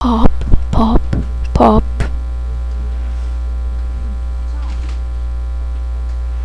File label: pop